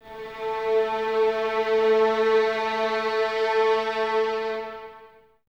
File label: violins A 3, String Ensembles, 23 Violins; Miroslav Vitous